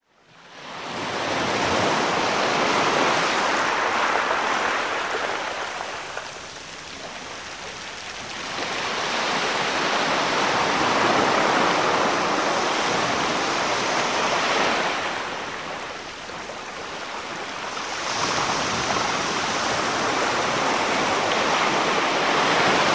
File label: Gentle Surf